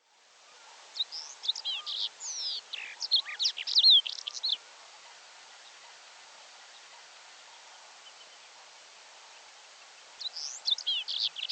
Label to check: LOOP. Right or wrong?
Wrong